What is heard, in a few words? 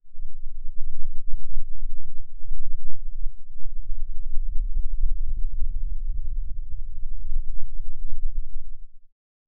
tube light fluctuation